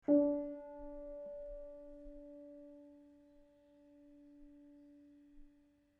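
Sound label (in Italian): Re3 orig, martello originale Pleyel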